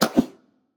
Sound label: arrow release and swoosh